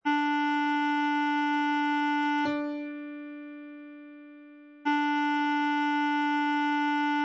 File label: d major d